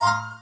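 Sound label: Ambience Partymode Crowd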